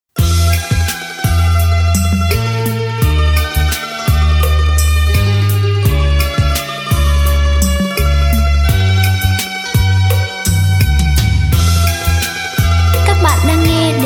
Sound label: beat, playback